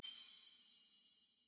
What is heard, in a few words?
ui button hover; champion; spirit blossom